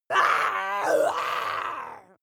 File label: witch growl; kreaturen